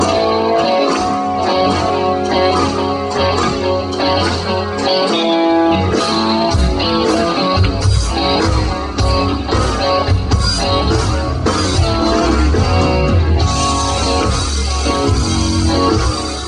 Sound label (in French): break batterie half time